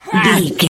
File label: voice male attack